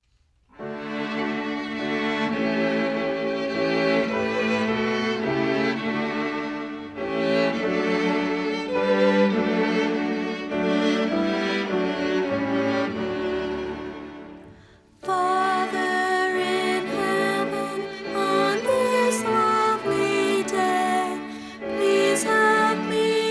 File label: a prayer words and music